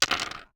DICE on Hard Wood, Throw and Roll, Standard, 5 Five Dice; Samples; ArticulatedSounds; DICE Sfx Party (Extended)